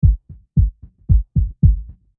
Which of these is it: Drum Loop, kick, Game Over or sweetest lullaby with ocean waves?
kick